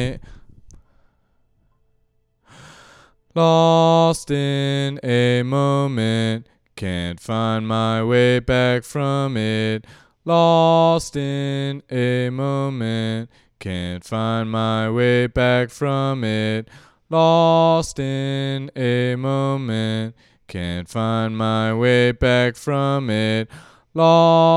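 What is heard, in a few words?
Male Ambient Lead Vocal; Whole Scape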